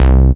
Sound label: BASS Stoil, bass; M8; Electronisounds